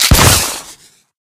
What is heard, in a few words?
grappling hook shoot; tf